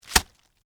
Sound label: Impact Cabbage Head, Drop, On, Cabbage, Leaf, Pile; Texture; Vegetable; Drop Sound Effects